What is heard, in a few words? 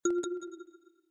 tone